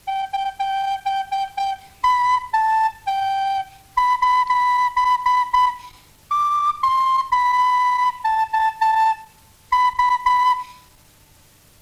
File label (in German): Blockflötenschüler, Gruppe IV; Nils Thoma Blockflötenschüler (Musikschule, Wertheim); Bäuerlein Bäuerlein tick tick tack